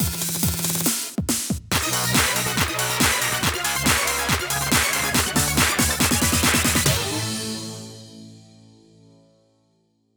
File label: Freedance BGM; Turn3; 1-2-Switch